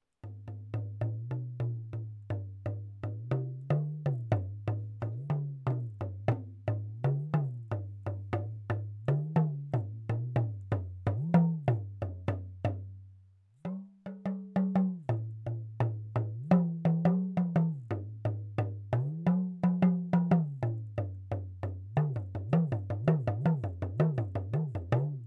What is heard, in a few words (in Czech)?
africky mluvici buben